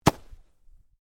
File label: Fireworks Single Launcher, Variety of fireworks being detonated